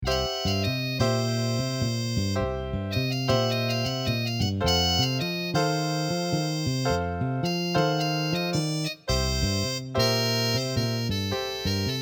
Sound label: MIDI blues